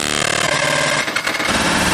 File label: Glitch